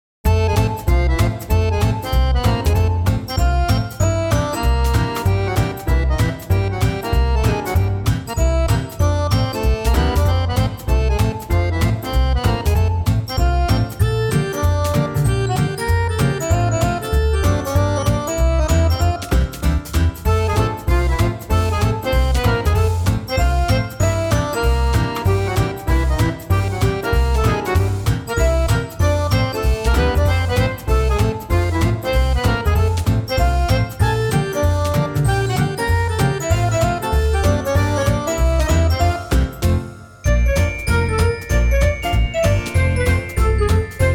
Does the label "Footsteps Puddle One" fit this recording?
No